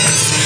Laser

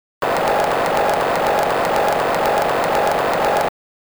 Cheers; Oretachi Game Center Zoku; Karate Champ

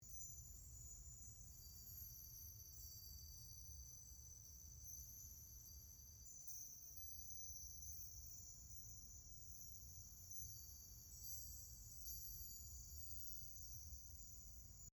Floating Symbol Ambience; Quad Fronts3; Journey

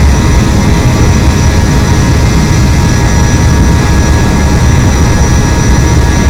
Jetcar Max

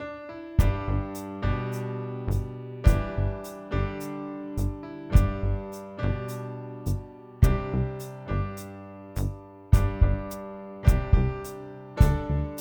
Social Hub Ambient Low; Plants vs. Zombies Battle for Neighborville

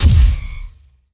Sci-Fi Weapons-Assault Rifle-Single Shot

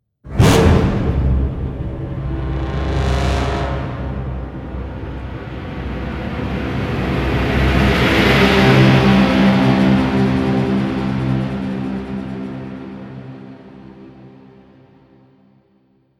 hit swell stinger; Heroes of the Storm

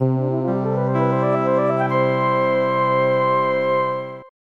Game Clear; Saibara Rieko no Mahjong Hourouki; SNES

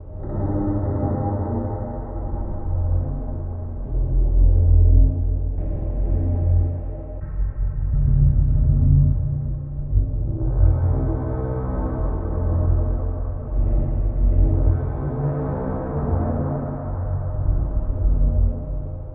Scary; Dark Dimensions: City of Fog

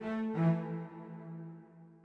orbit cello; Sky Children of the Light gamerip

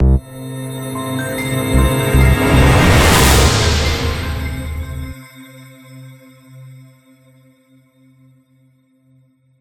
Transition End; Everspace 2

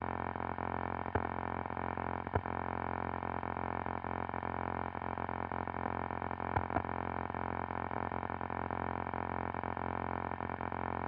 scanner; five nights at freddy s fnaf 3

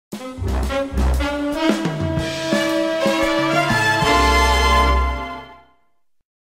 Stinger Win

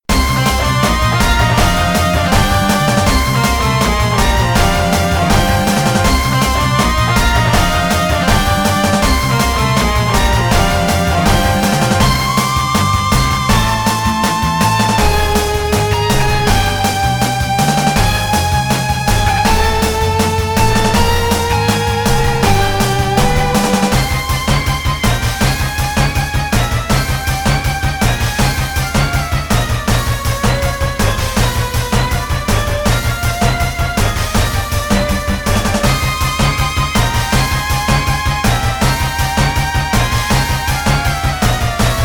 Unused Battle Theme; Tales of Symphonia; 2004 North America GameCube gamerip

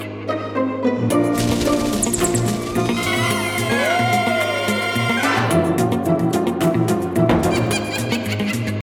halloween piano

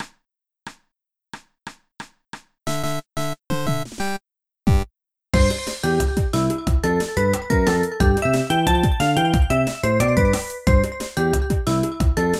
Xylophone Home Perfect!; mario party island tour

